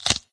Chocolate Removed; candy crush saga gamerip

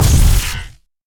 Sci-Fi Weapons Machine Gun Single Shot

